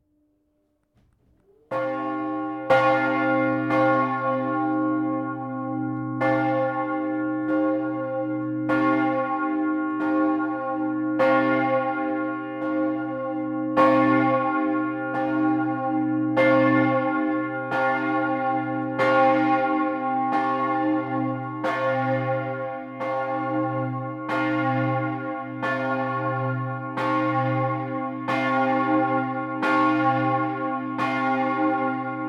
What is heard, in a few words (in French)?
Lausanne cloche; campanologie